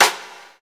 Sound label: SNR, Reverbs; Club-50 - Foundations Roland; Verb 3